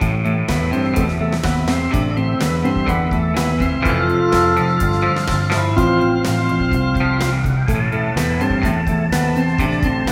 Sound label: Win; Chateau Creep; Cooking Dash